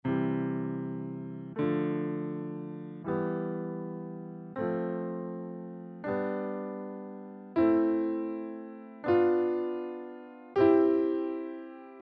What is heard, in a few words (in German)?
Stufen in C Dur